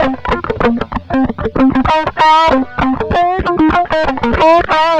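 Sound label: distortion guitar; USB Soundscan vol.31; Miro s Grooves; AKAI; DISTO GTR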